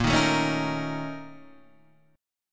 Bb Minor Major 7th Double Flat 5th, Guitar, DADGAD